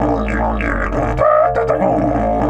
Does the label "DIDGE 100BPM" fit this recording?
Yes